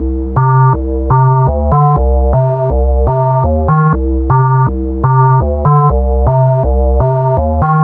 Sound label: 122 Cm, Melodic Loops; Electronisounds